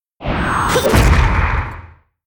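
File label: meerking attack, monster; Senillinea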